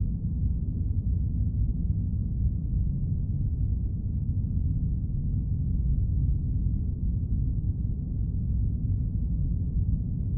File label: underground background, ambient